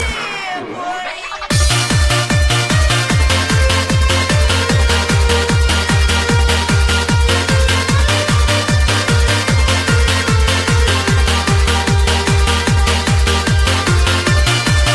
Lullaby

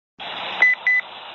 MBITR BOOP

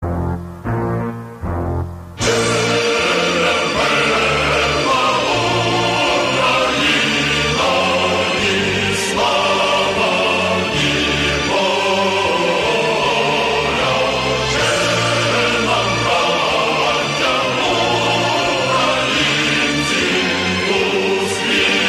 Ukrainian anthem

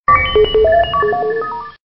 Bleeps

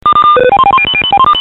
Roger beep

